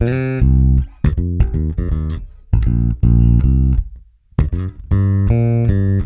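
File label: shuffle C, bass; Spectrasonics; Bass Legends; SHUFFIT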